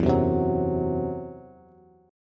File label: E Suspended 2nd Sharp 5th, Bass; Esus2#5 strummed